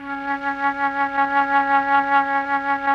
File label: MLTRON FLT, MELLOTRN; Sonic Reality; Vintage Timetraveler